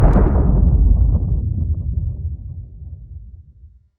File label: Underground Mine Explosion; explosion blast